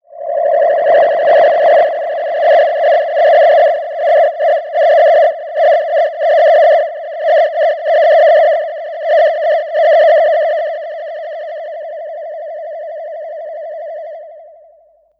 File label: Tape Delay Feedback; radiosonics